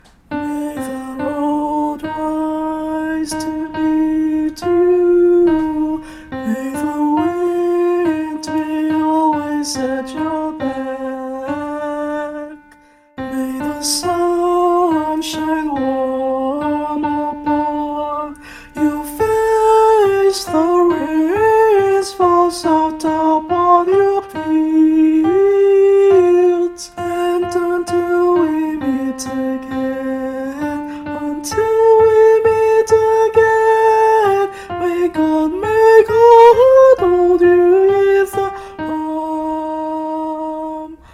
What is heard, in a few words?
alto; an irish blessing